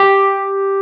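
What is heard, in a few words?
Wurlitzer G4 - R; USB Soundscan vol.09; AKAI; Keyboards Old School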